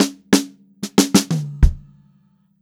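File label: STRAIGHT FILL, Funk-Groove Drumloops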